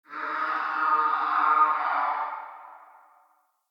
Wetland Forest Day Creature Distant; Midrath Island; Ambience

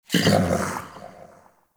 Snow Critter Vocal Tranquilized Grunt; Warframe; Hunting Critters

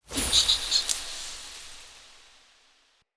Squirrel Mote; Animal Motes

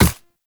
Operator Run Ice, Footsteps; Warframe

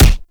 Fulmin Prime Alt Punch; Weapons

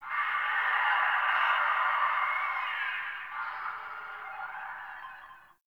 Distant Infested Screams Winter G; Warframe; Ambience; NineteenNinetyNine

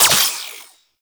Pistol Shot; Guandao

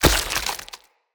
Corrupted Slug Thorn Shot; Creatures; Abilities

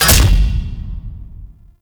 Attack Impacts; Gameplay; Golden Maw; Warframe; Ambience; The War Within

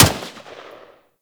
Grineer M16 Wraith Fire; Weapons

